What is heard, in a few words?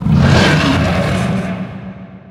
monster roar